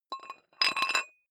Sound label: dumbbell thread put on weight slow, fitnessstudio